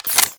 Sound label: Reload, Weapons; Strun Prime